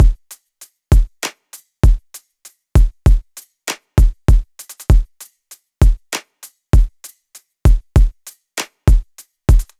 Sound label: Drum Loop 98 BPM, Hip-Hop; 99Sounds Music Loops; 99Sounds x Monosounds